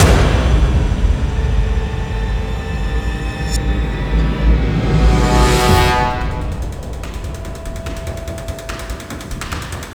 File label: Blade Stinger